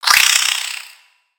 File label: Spider Angry